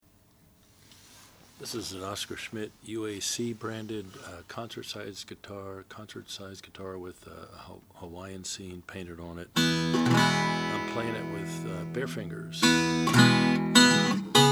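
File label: oscar schmidt black white hawaiian, Guitars; 1932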